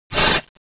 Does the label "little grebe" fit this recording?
No